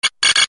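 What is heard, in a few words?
blackberry alert, sms; ringtones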